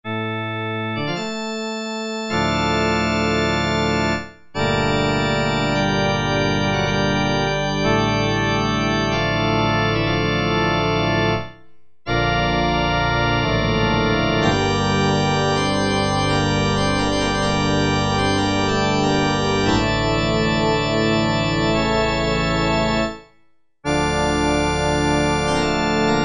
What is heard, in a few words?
Pipe Organ, Roland D-110; Factory Patches